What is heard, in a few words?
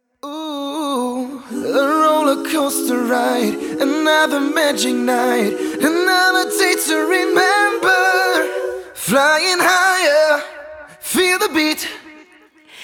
Acapella English